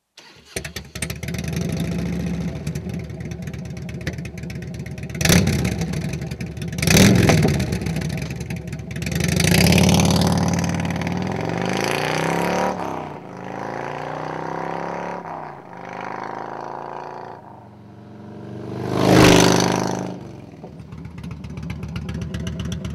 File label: Gunships Unbaffled, exhaust